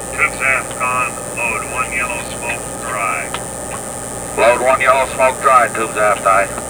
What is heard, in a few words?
tubes aft yellow smoke; OD orders the after torpedo room to load one yellow smoke dry.; Caiman